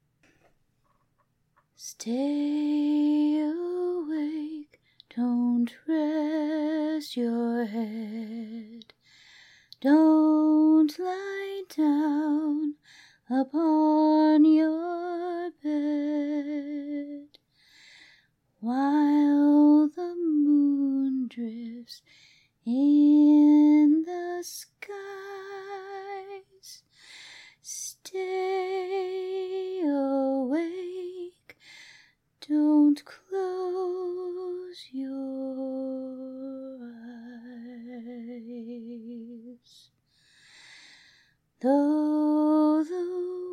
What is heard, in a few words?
Vocal Lullaby Vocal Acappella Lullaby; Mary Poppins